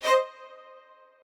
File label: strings